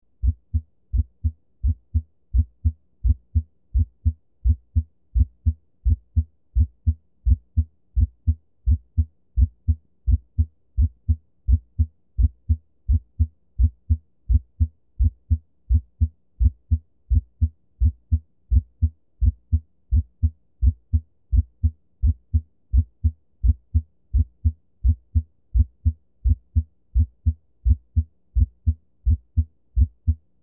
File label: Apex, Split S1, Supine, Bell